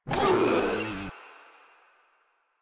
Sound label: Proto Infested Runner Pain; Warframe